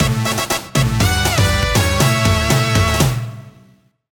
1-Up Theme; Sonic Overture

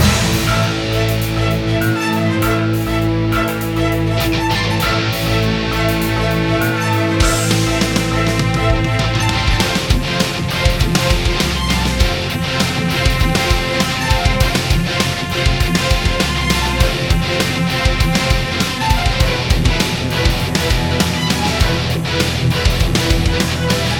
TENSION; DuoiChienHao

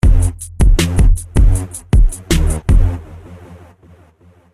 Action

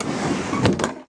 UI low Draw Close; Clumsy Ninja; Android, iOS

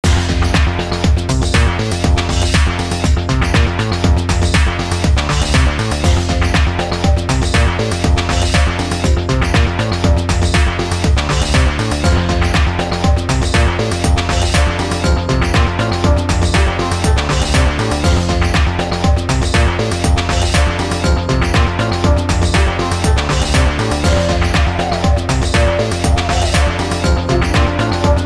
Asian tune; Soccer Slam; GC, PS2, Xbox

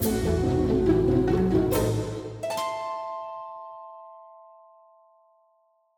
Piano; tintin reporter cigars of the pharaoh

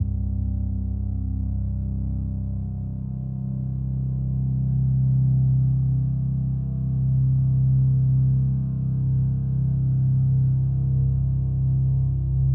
Overlay Drone

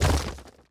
dirt break

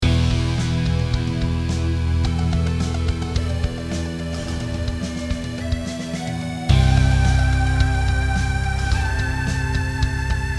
Ending; BullShot

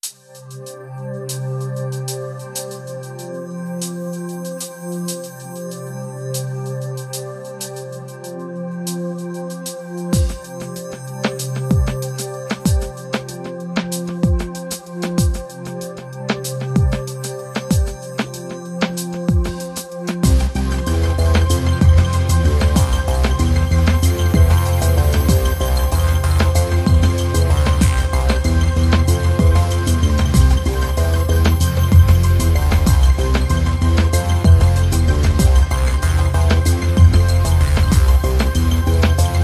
BGM; Adventure Player; psp

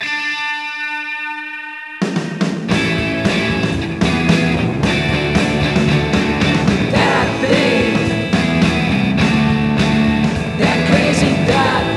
Ditty; ESPN NFL Primetime 2002

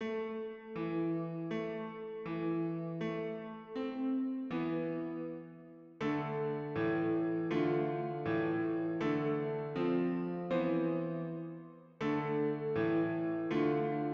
short piano title end; cutscene music 7

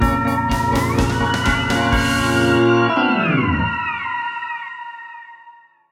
Chateau Creep (Transition); Cooking Dash

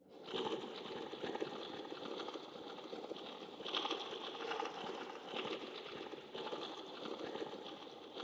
Cave Music Silence Writhing

